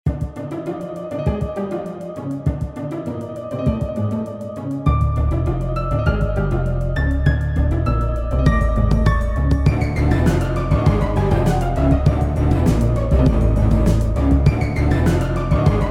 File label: Funny Horror; Dual Blade: Battle of the Female Ninja